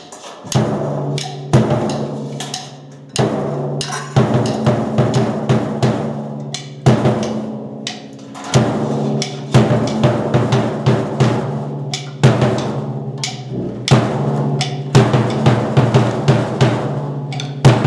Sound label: Intro Bass Drum, samba